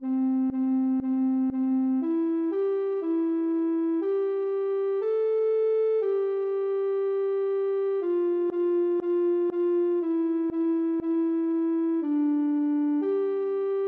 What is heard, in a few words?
ocarina